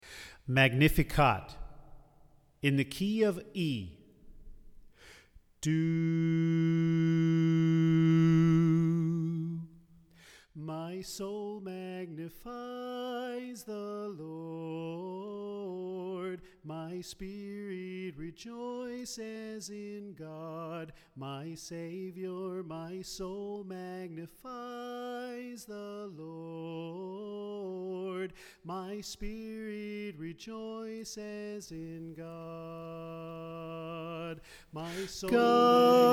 Tenor

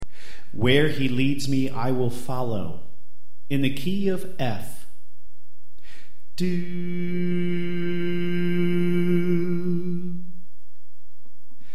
Alto Left

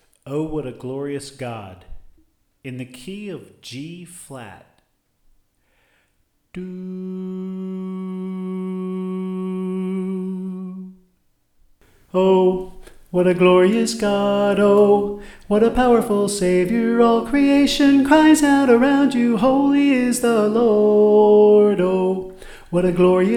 Soprano

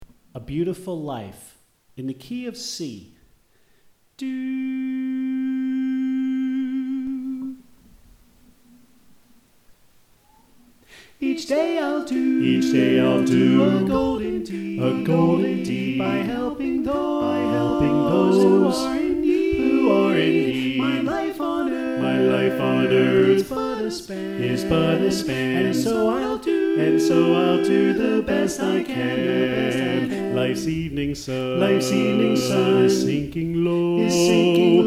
Tenor Left

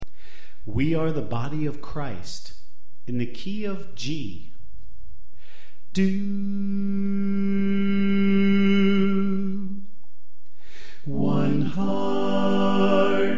Tenor Left

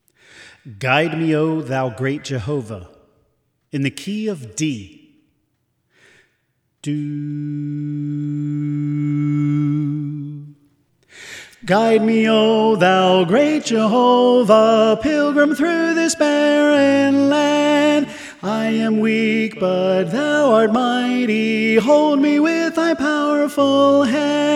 Soprano

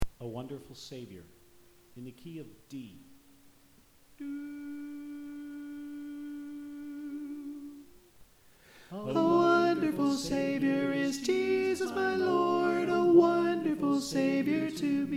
Alto; A Wonderful Savior

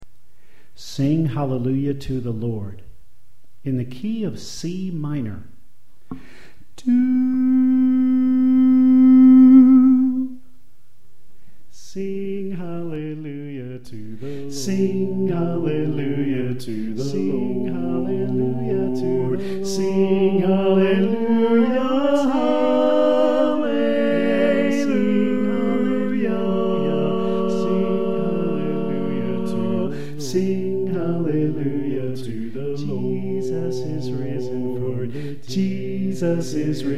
Men Left

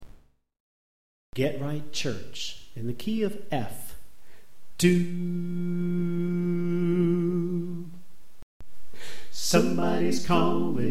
Soprano Left

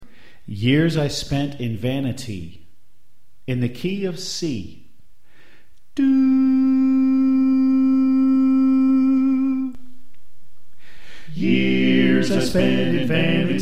Soprano Left

